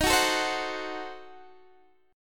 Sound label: Eb Diminished 7th Eb, Guitar Open D